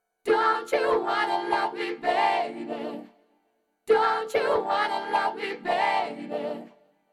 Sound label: NTS-1 mkII Vocals Vocoder